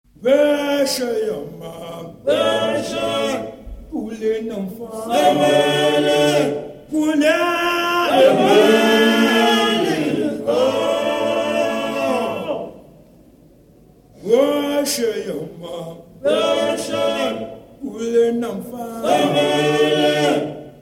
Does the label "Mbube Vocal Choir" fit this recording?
Yes